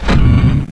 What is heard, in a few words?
stomp; endboss